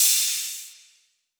Cymbal, Drum One Shots; Ticket; Hip Hop

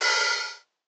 Open Hihat; Eternity; Cymatics; Lofi Starter Pack

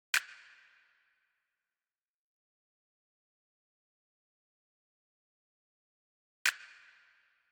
Drum Loop 152 BPM Snap; Cymatics; Vibes II Drum Loop Collection; For You Drum Loop

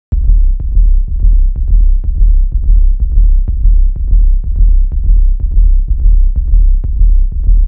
Bassline 125 F; Before U

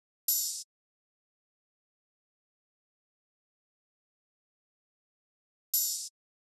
Wood Block Drum Loop 176 BPM Open Hihat; Cymatics; Mystery Pack Vol 4

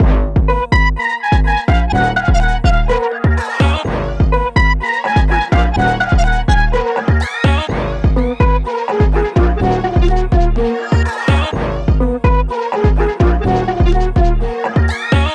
125 BPM; Melody Loops; Fever Dream; Cymatics

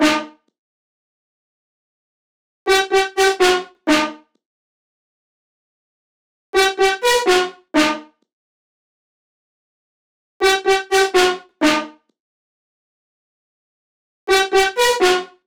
124 BPM E Min Lead, Melody Loops; Loop Stems; Cymatics; Anubis

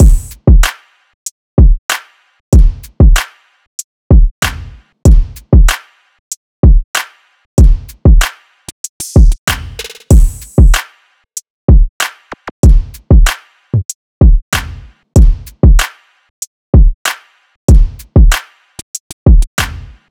Drum Loop 95 BPM; Space Drum Loop; Cymatics

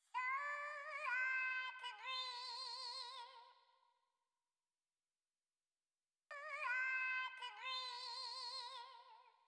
Vocal, 86 BPM E Min; Cymatics; Gems Free Teaser Pack; Cymatics Gems Vol 9; Dreamers

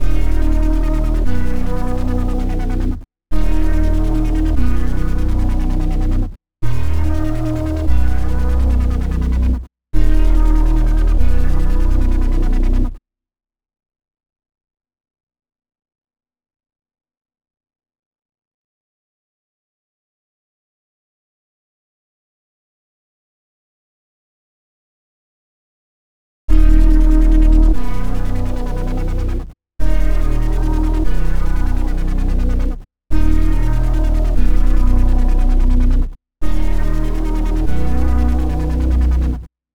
Bass, 145 BPM D# Min; Stems; Cymatics; 2022 Melody Collection (BETA); Wavy; WTF

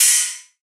ride, cymbals, drum one shots

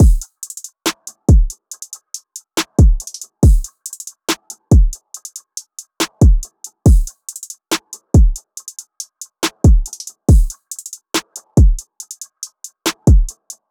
Drum Loop 140 BPM; Pluto; Cymatics; DOPE DRUMS VOL. 1

